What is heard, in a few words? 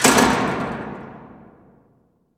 Jail Door Clunk; Prison Architect